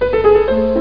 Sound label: Lightning Storm; Disaster